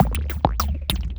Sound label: Tribal 1-2 Beat; Sonic Foundry (Sony Creative Software); Ma Ja Le Ethereal Textures; Beats